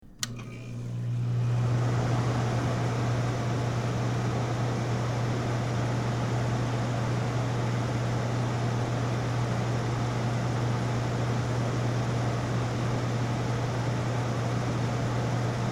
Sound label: Air Conditioner; Air Conditioner High Setting; Household; Air Conditioners